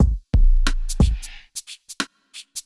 bell ringer

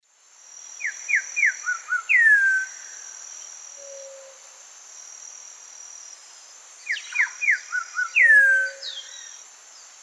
Saltator coerulescens, song; S. America; Eastern Lowlands

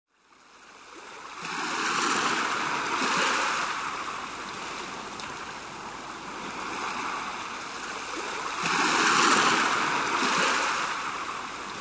Wave, Stream, Water drip, Thunder; World Music; sounds of Nature; Yoga amd med music

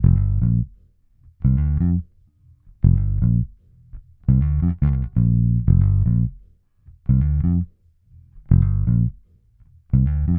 Bass Hip-Hop

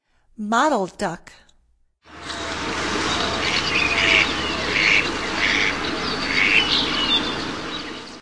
Mottled Duck; North America; Stokes

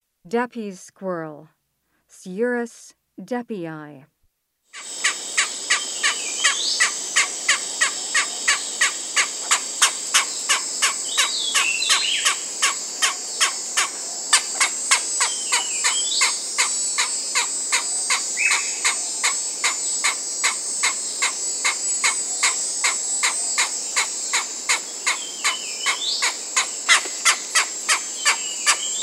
Deppe's Squirrel; S. America

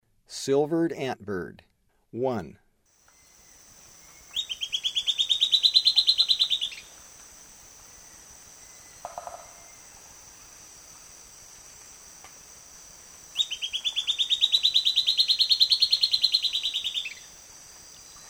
Silvered Antbird; S. America; Eastern Lowlands

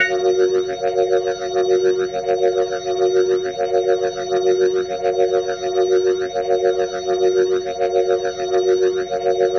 Glowing Organ F# Maj7; Major 7; The Vitale Organ

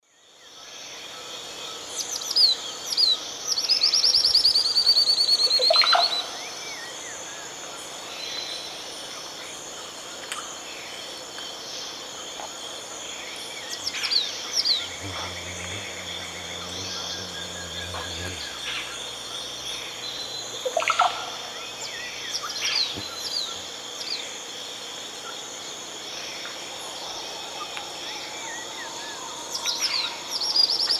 Myiopagis caniceps, call and song; S. America; Eastern Lowlands

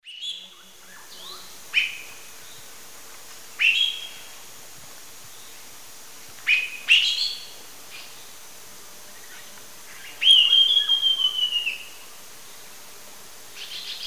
Turdus lawrencii, calls or song; S. America; Eastern Lowlands

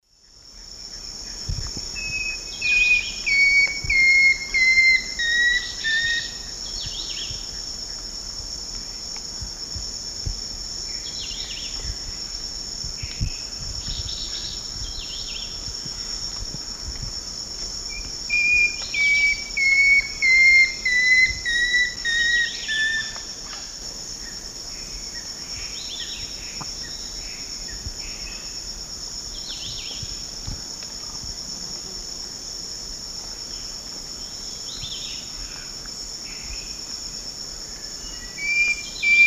Deconychura longicauda, song; S. America; Eastern Lowlands

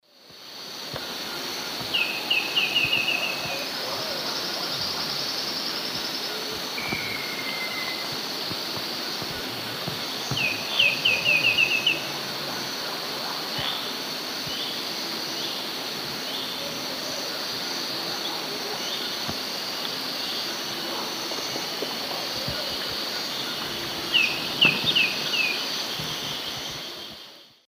Pachyramphus marginatus, song; S. America; Eastern Lowlands

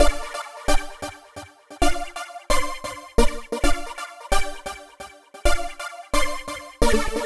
Lead Synth; Dance; Synths Lead

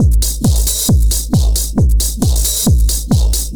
Drums Techno

